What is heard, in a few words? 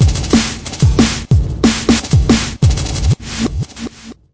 183 bpm, drum loops and breaks; 181 bpm plus